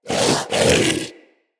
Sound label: Vocal Breathe; Characters; Hunting Critters; Vulpaphyla